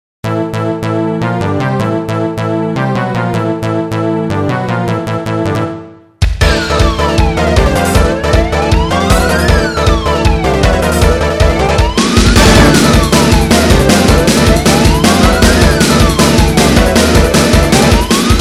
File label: battle